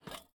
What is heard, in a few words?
step, copper golem weathered; Minecraft